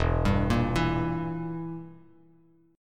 E Diminished E, Bass Standard; Edim arpeggiated; JGuitar

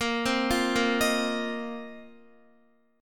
A sharp Suspended 2nd Suspended 4th down Guitar; A#sus2sus4 arpeggiated

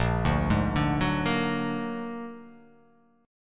A sharp Augmented 7th; x,1,0,1,2,1,0,x

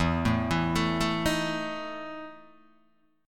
E Major 7th Suspended 4th E, Guitar, DADGAD; chord shape

